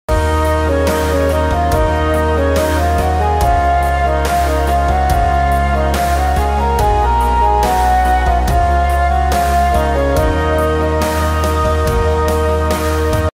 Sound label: instrumental ringtone female version